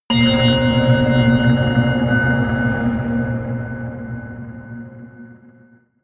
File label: melodic anvil scrape high